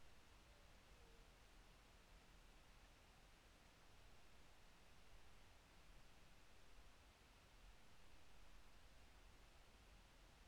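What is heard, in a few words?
hydrophone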